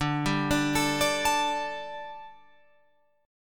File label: D Suspended 4th D, Guitar, Open F; Dsus4; arpeggiated